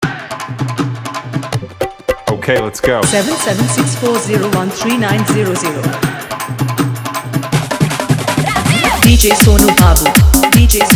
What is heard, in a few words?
Dhollki Bass; Dance Mix